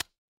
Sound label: ui toggle